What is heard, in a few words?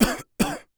Human Male Cough Two Times